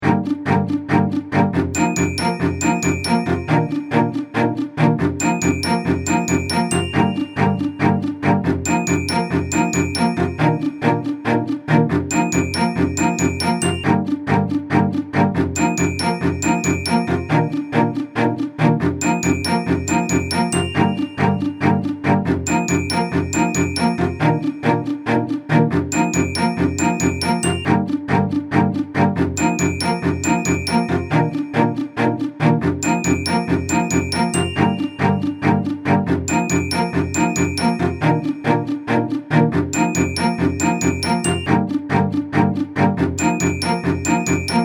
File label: instrumental